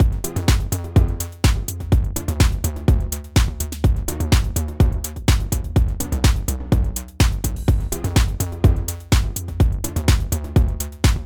French House and Techno

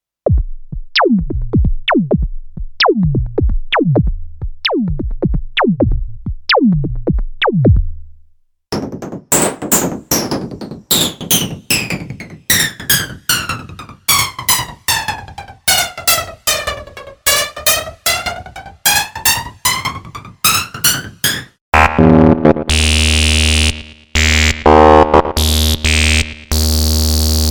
acb percussion

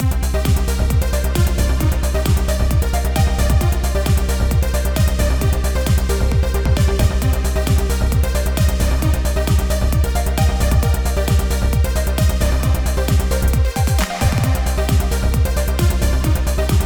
trance edm collection; solidtrax

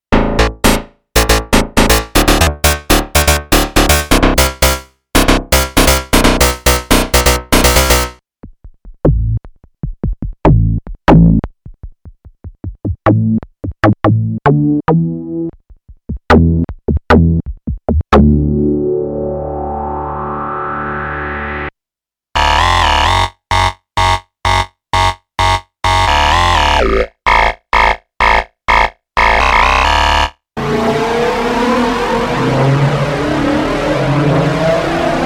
fm attack bass

